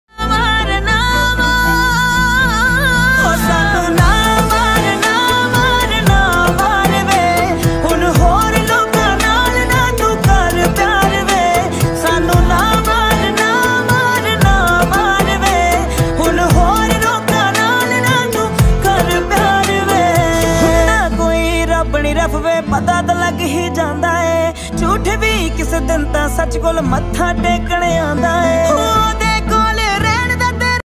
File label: female, Punjabi ringtone